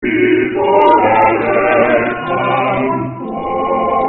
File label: quartet test